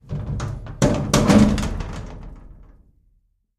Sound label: Vault Inner Door Open; safes & vaults